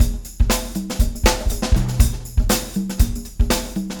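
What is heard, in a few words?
120 beat con; Big Fish Audio - DOM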